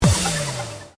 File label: Bonus